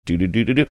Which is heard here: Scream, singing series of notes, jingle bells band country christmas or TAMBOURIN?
singing series of notes